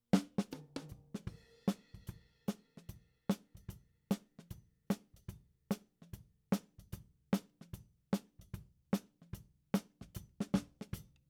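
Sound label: Snare top, Ragtime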